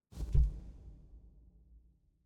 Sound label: Flower Bounce; Desert SFX